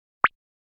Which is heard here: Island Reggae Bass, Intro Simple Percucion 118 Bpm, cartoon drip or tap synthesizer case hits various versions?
cartoon drip